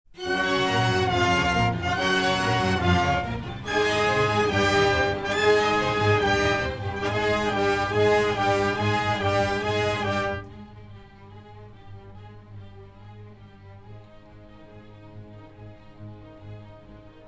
Orchestral, Anechoic; OPENAIR

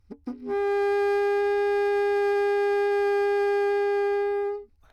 baroque bassoon high; OPENAIR; Anechoic

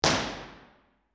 dixon studio theatre; university york

